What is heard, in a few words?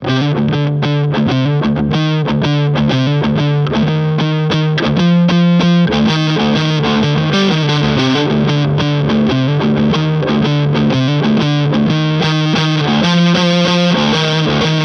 Music Man Mariposa gain neck